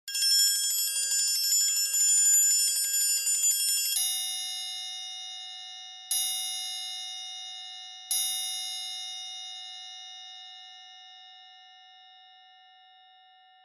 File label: TRIANGL